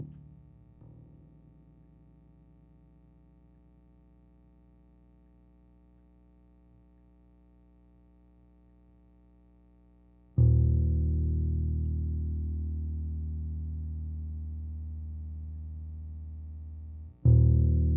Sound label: bass